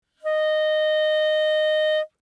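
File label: Eb Clarinet fortissimo Eb5; Woodwinds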